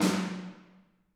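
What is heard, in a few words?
snare drum, Drums; Bob Clearmountain Drums I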